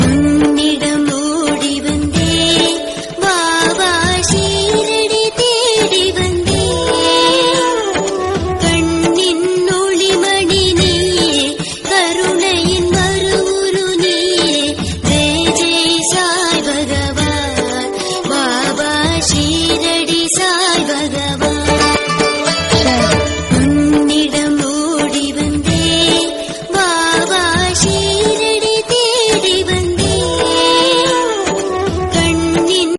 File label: bhakti song, Tamil; Shirdi Sai Baba